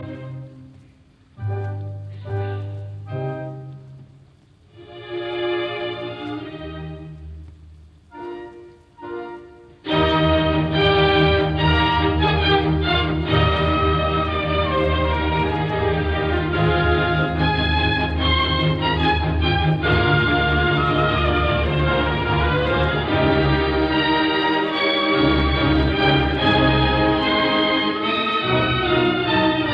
Piano Concerto No 3 in C minor Op 37 I Allegro con brio